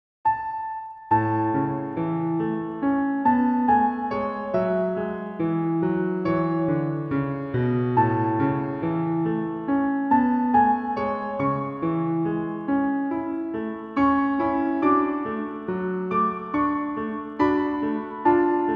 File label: Pno Arr